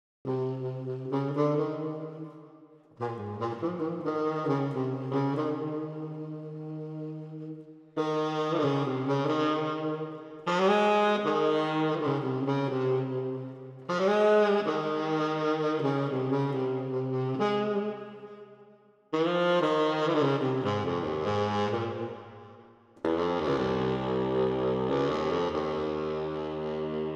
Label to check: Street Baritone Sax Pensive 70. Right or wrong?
Right